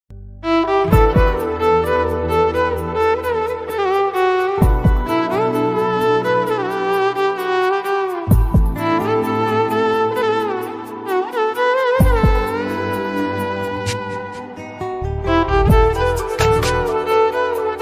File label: violin love ringtone romantic love violin mobile ringtone